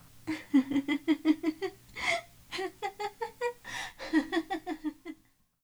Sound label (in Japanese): warai; 女; 笑い12 ンッフハハ （大人）; ちょっと様子のおかしいお母さんorお姉さん